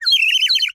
Bird whistle, clay, painted, water, airy, wispy, flurry, very strong, burst, short; Eiravaein Works; Vaeyan IV